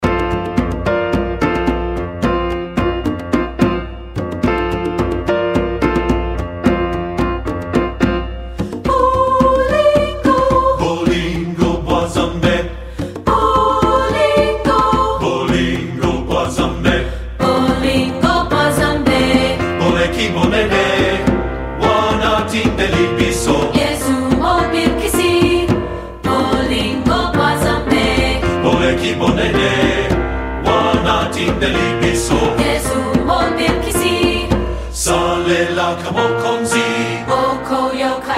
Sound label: SATB Choir